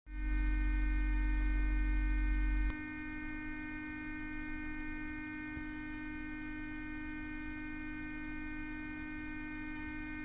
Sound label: park sound in declination drive